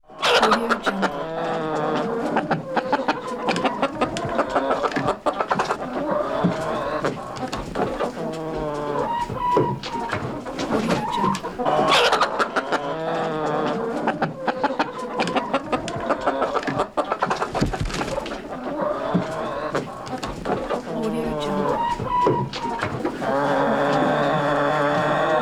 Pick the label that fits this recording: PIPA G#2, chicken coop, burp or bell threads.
chicken coop